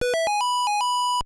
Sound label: GAME START; Cracked; Atari 7800